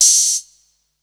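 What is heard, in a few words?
open hi hat, Drum & Bass; Ueberschall; Drum & Bass Resonance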